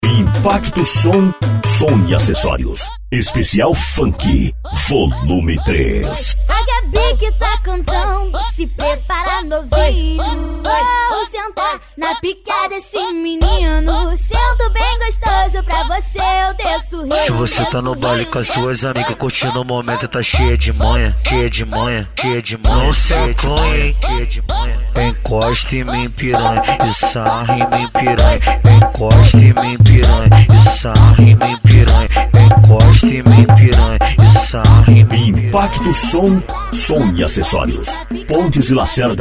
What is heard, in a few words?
funk bass; impacto som